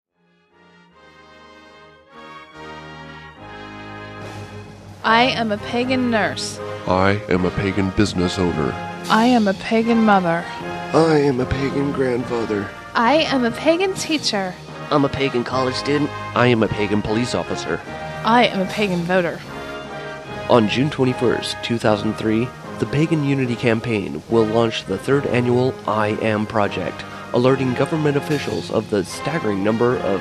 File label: anthem w male vox